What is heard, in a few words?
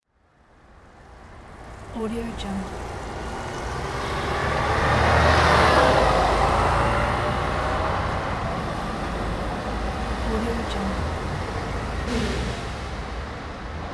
city traffic after rain